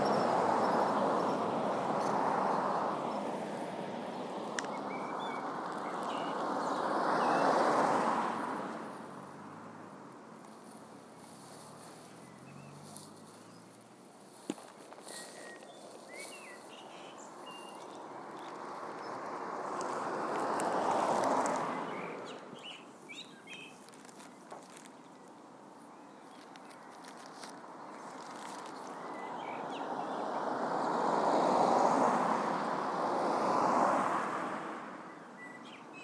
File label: bus stop birdsong